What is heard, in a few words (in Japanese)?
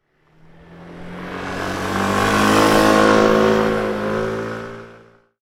バイク通行音